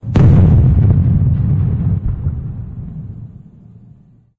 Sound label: explosion